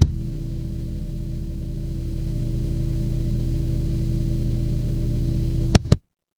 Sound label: fire electric hum